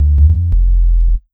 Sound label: SUB, Ragga & Dub Reggae; USB Soundscan vol.27; AKAI